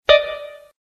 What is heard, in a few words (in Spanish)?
letra usada; hangman